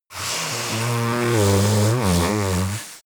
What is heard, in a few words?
yeti nose blow long